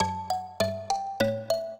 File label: minuet, mbira; Mozart